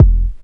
KICK deep, Techno Trance DRUMS

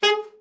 ALT SRT, ALTSAX SHORT; Giga Samples Collection

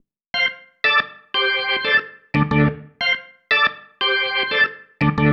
Single Riff, Organ

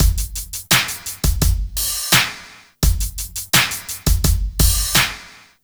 Smooth, R&B Drums; Sonic Foundry (Sony Creative Software)

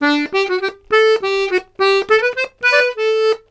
Accordions, BOUREE; USB Soundscan vol.40; AKAI

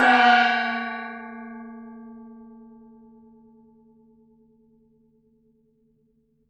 CYMB FX; Univers Sons - Raricussions

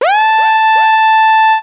lead syn, Techno Trance

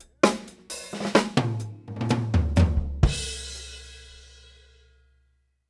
Fills, Monster Beats; Zero-G

